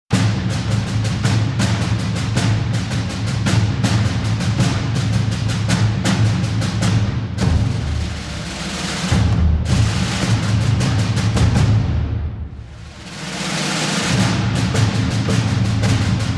drums only version

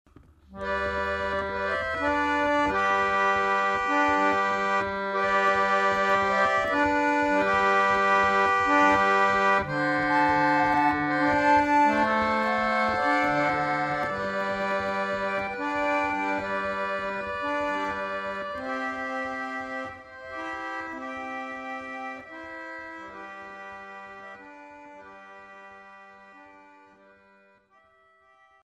Silent Night (Gem Rollar Organ)

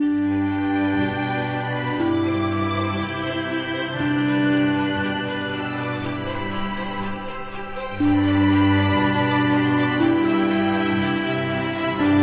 Refreshing Ambient

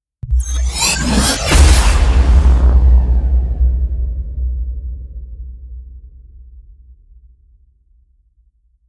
Impacts Abstract Explosion; Gothic Storm Music; Advanced Sound Design 2012

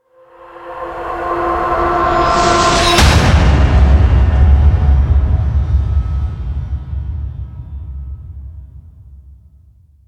Shadow Hit Swell; Ninja Tracks

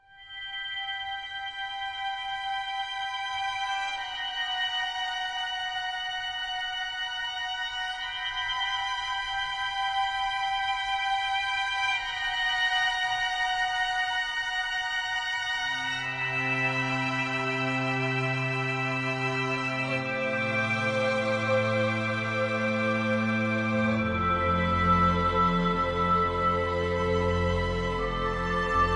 Enchantment Ambient

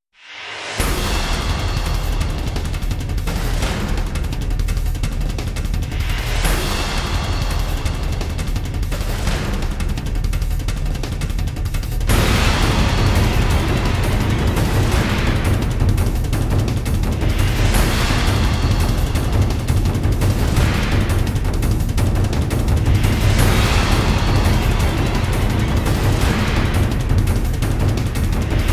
Countdown, Cinematic Apocalypse; Liquid Cinema

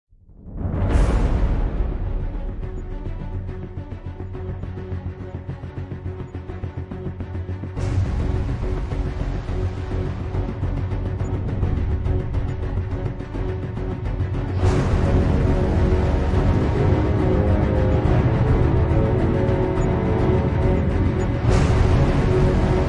No Vocals

